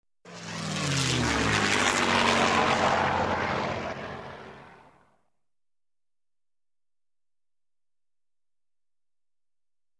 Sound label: Warplane Whirring